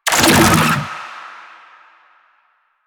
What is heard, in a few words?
Infested Move Start; Warframe